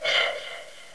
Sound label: breath